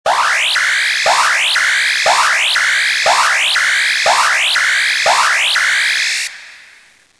Poplach; Vesmir; Revelation Sight and Sound SK

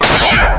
Laserova zbran; Vesmir; Revelation Sight and Sound SK

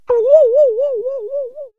Hra na pilke; Revelation Sight and Sound SK

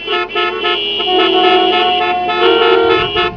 Trubenie aut; Doprava; Revelation Sight and Sound SK

